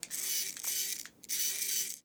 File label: servo robot mini toy two leg spinner; soundmorph